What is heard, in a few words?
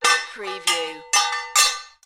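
Sword Short Clash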